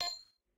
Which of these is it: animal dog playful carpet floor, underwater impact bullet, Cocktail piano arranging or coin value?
coin value